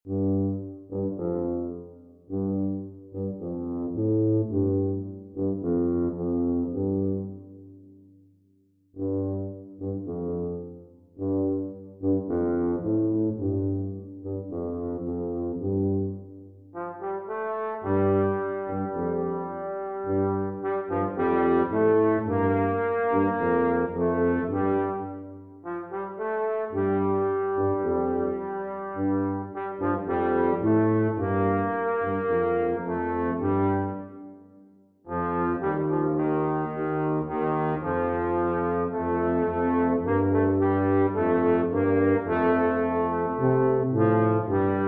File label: trombone tuba duet